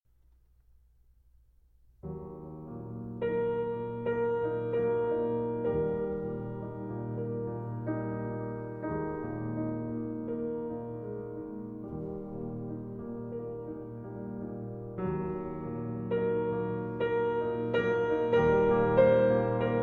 Cello Sonata For Cello and Piano in F Minor, Andante